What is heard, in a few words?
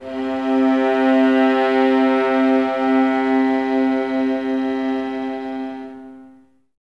violas C 3, String Ensembles; Miroslav Vitous; VLS 1+2